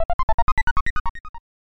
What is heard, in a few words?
Unknown Jingle; Earthbound; snes